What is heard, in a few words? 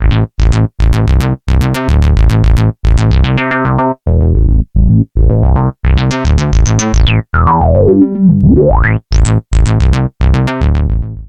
Moog Taurus